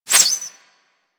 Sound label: Arrow Whizzby; Warframe; Weapons